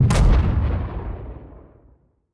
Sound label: kaiju lightning hit explosion; Stellaris; biogenesis; weapons